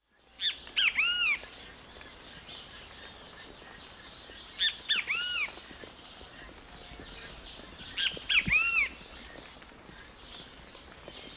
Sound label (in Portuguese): Bem-te-vi Pitangus sulphuratus; Jacauna